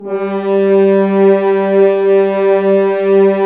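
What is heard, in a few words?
French Horns sfz; Roland L-CDX-03 Disk 2; F.Horns 2 f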